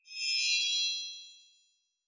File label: Monster Shield Bar; Evolve